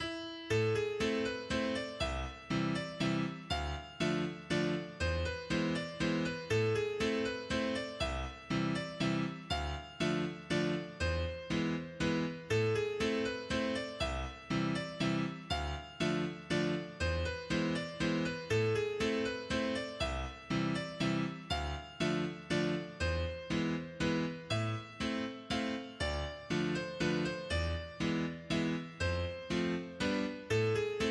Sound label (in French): Menuet de la chaine, morris